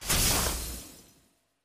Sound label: win line flash; fairyhansel